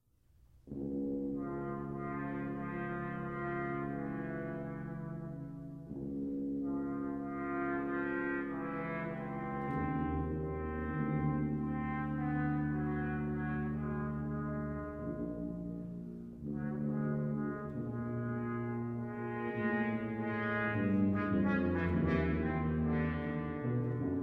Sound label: Brass Quintet; No. 1; mvt. 2